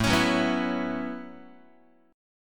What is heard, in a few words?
A 6th Add 9th A down Guitar; A6add9; strummed